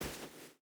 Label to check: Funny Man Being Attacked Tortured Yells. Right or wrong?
Wrong